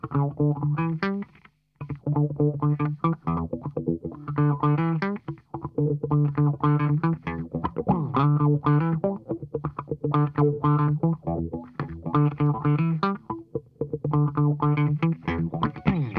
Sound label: BACK 2 BACK, Funk Guitar; 90 s Sample CDs; Zero G